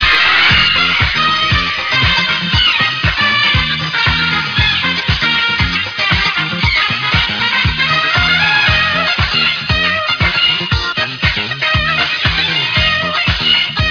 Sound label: disco